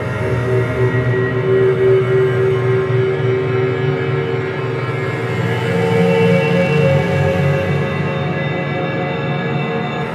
Spectral Chorus; Sonic Foundry (Sony Creative Software); Loop Noir

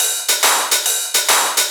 Shuffled Loops, 140 BPM

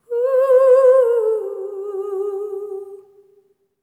SERENE, Symphony of Voices; Spectrasonics

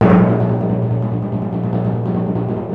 TIMPANI

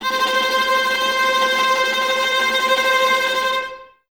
Violas Tremolo C 4, String Ensembles; Miroslav Vitous

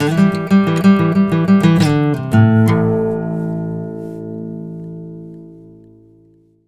BARI LICK, Blues Guitar; ILIO; Fretworks; 120 BARI LICK

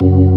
full fast, ToneWheel Organ; E-MU Formula 4000 Series Vol. 3; Analog Odyssey

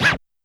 Scratch, YAMAHA RM1X

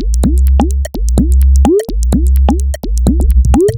127bpm, House Drumloops; Zero-G House Fabrik Drumloops; ZG Drumloops

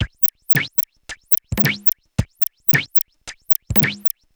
Juno Filtered Korg MR-16 (110BPM), beats; musicradar; cyborg beats

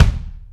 Bobo Kick, Kick One-Shots

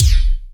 JD800 Dance Card Drums

